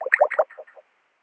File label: hold call; Netgear SPH200W Skype WiFi Phone